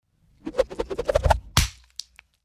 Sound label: Throwing ax, spins through air and hits flesh